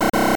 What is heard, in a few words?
Incorrect; Capcom no Quiz Tonosama no Yabou; Genesis